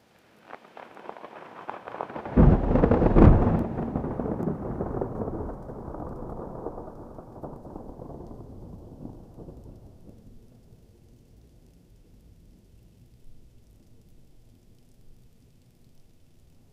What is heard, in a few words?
warm resonant thunderclap; 3d stereo